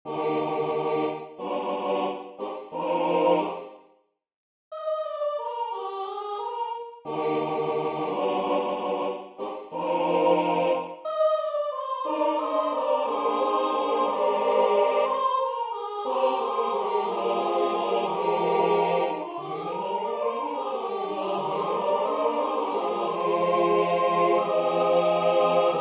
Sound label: Three Little Birds in a Row SATB